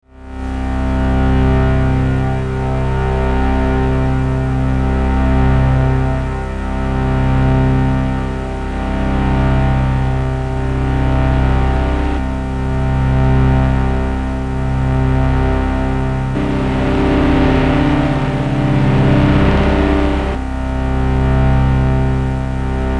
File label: Heat Noise Drone